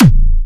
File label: Springer A3, Big Room Kicks